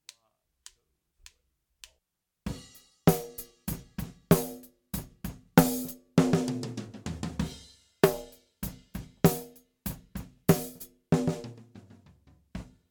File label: Comp Snare; Drum Recording Setup